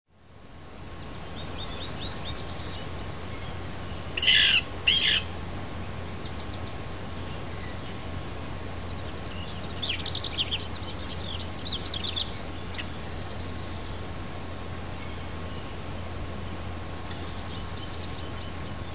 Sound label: lewis woodpeckers mating, eastern washington